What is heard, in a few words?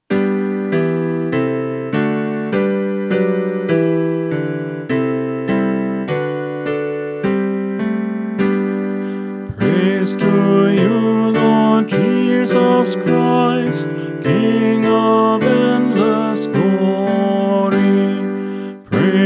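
Gospel Acclamation All Parts, Choir Practice Tracks; Lenten; Good Friday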